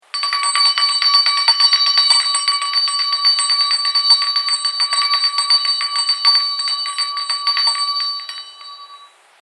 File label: Small School Hand Bell Vintage; Musical